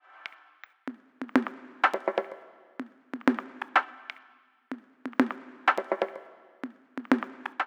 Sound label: 125 PERCUSSION; drums pads